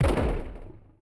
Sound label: INT backfire, Vehicle; NASCAR Thunder 2004